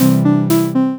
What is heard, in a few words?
melody